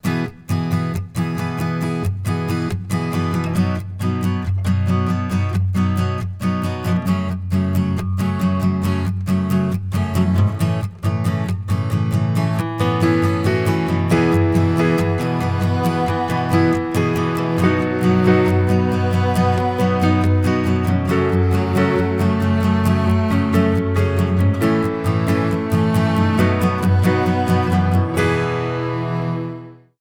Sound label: No Goodbye B (no drums)